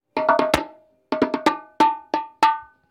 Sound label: Bongos quick pattern